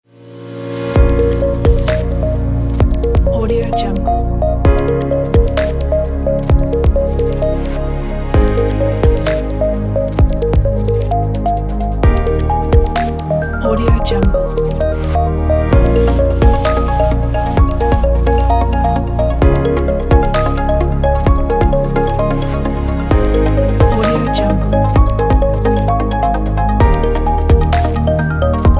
abstract background pack; cat wild

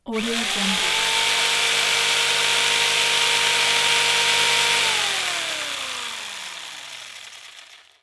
sabre saw operation; stormwaveaudio

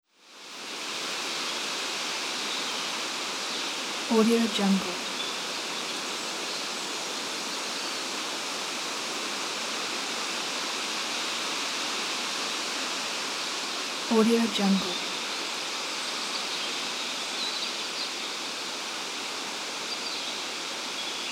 wind through trees

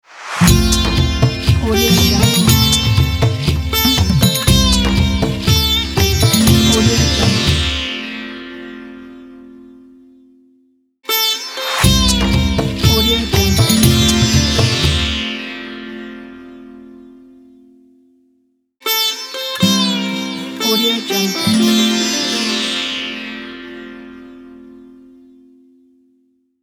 indian holiday logo

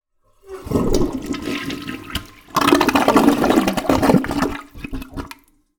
toilet flush super close gurgle; collanderson

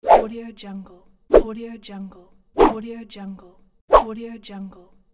the wooshs

